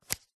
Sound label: foley card beer mat set down and slide on table; zapsplat